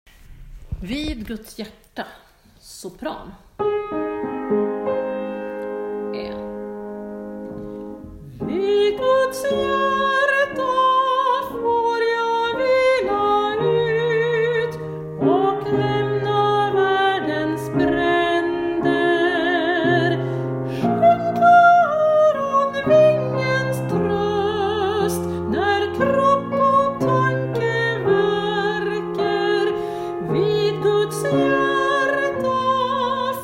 Vid guds hjarta sopran

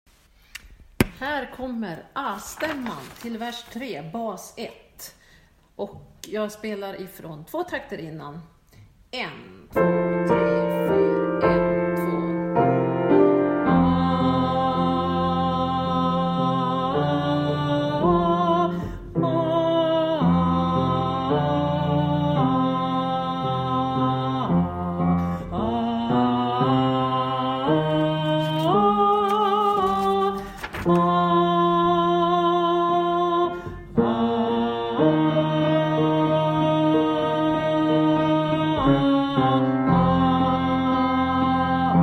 Herdepojken bas astamman

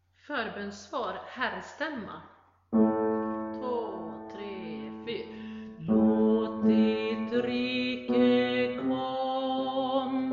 Forbonssvar herrar

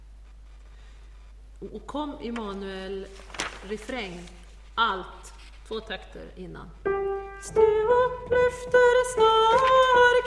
Immanuel alt refr

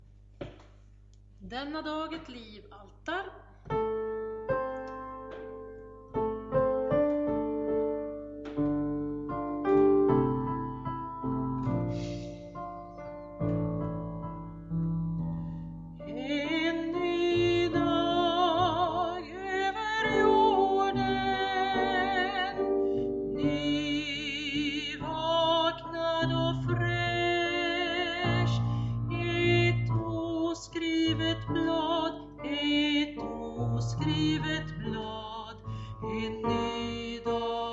Denna dag alt

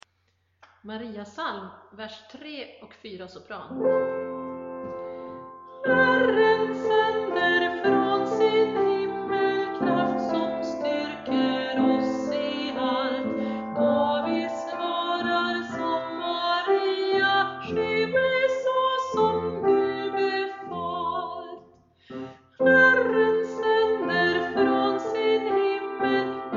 Maria psalm sop

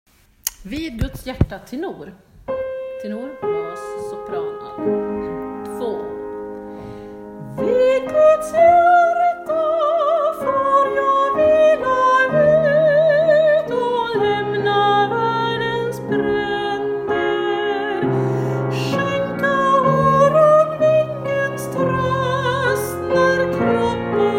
Vid guds hjarta tenor

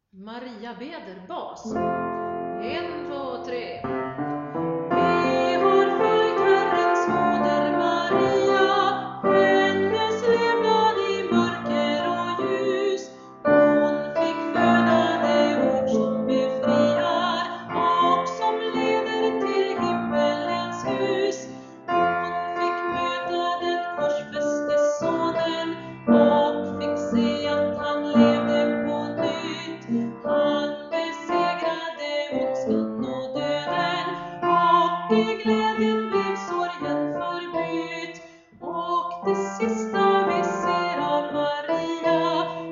Maria beder bas

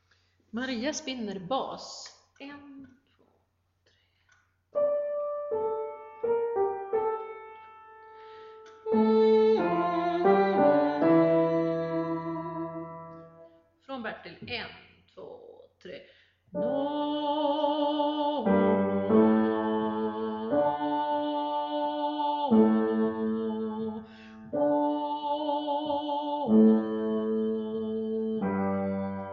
Maria spinner bas

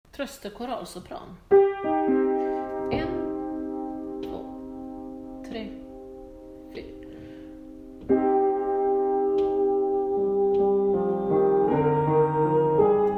Trostekoral sopran

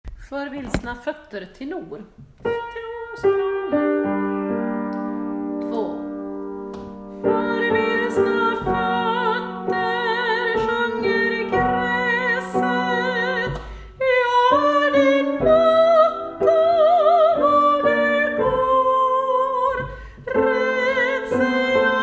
For vilsna Tenor

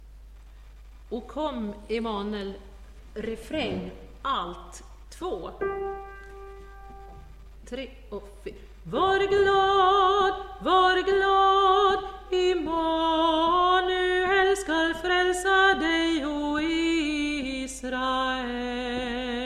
Immanuel alt refr

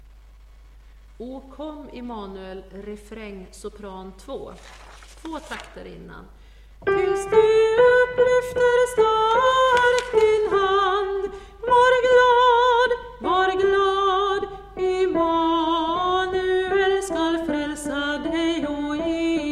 Immanuel sop refr

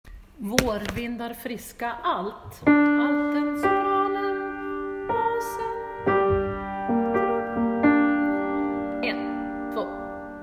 Varvindar alt

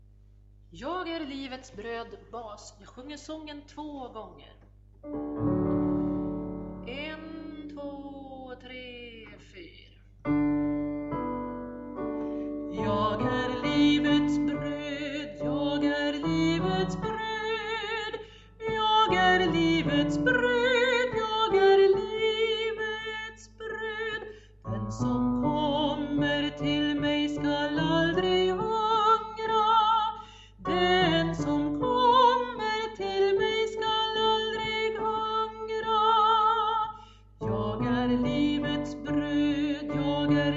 Livets brod bas

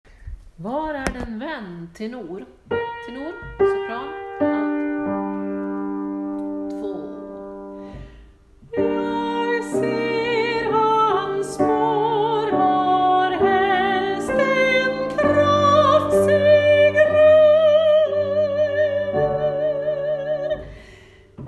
Var är den van, Tenor

